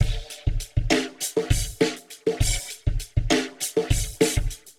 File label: 100 Splashy Beat, Warped Live Drums; Loops; Touch Loops; CosmicFunk